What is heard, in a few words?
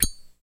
Zippo Lighter Open; lighters & matches